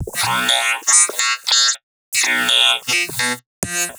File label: Vox Glitches; Sonic Foundry (Sony Creative Software); Underground UK House & Electro